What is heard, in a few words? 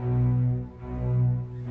serious cellos; STRINGS ORCHESTRAL CLASSICAL LOOPS